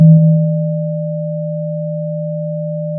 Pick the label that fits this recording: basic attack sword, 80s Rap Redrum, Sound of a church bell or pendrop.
Sound of a church bell